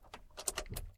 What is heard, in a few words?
button turn; Apotheorasis